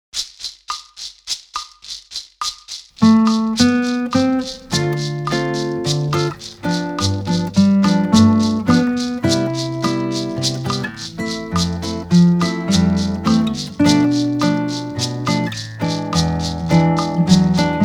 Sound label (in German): Begleitung